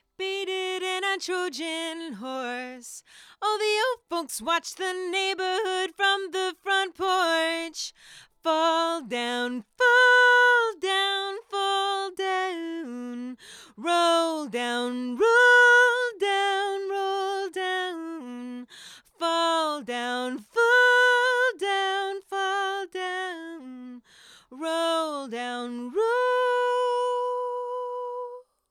LD Voc Double

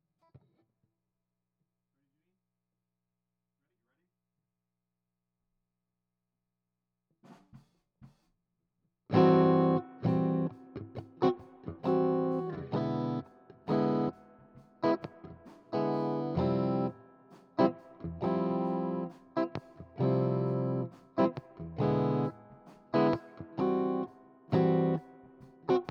GTR

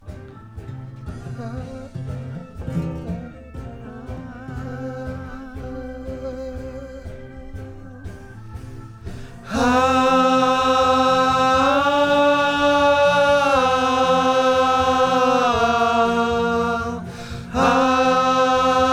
VOX Double

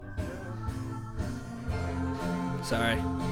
VOX

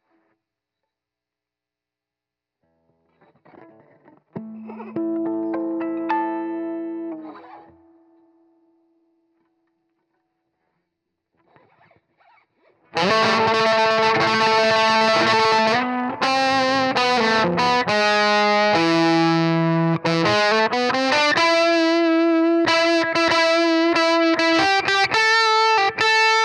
Gtr Solo

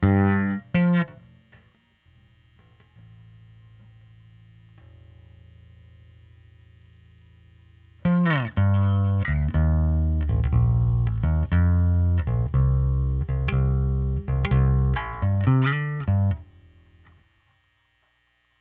Bass OD

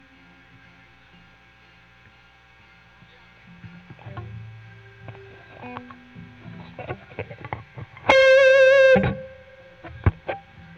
Solo Gtr

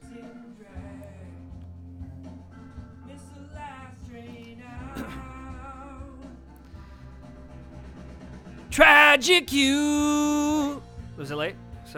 VOX

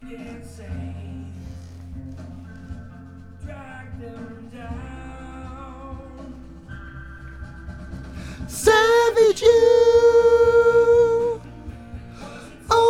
VOX Back

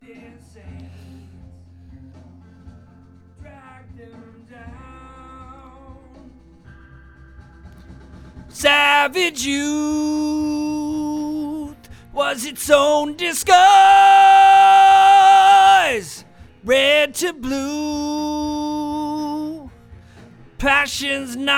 VOX Double

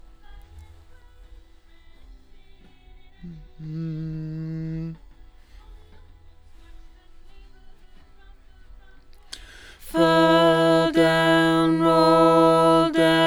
LdVOX Double

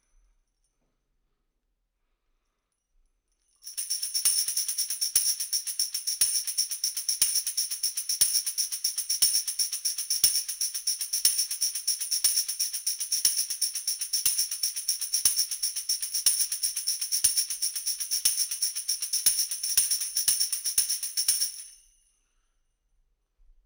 Tamb

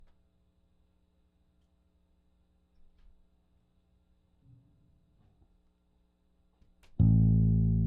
Bass Amp

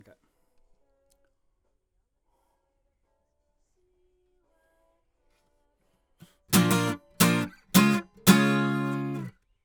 Act Guit Comp L

